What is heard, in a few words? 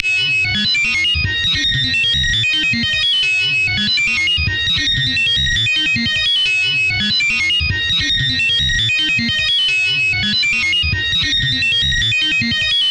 Industrial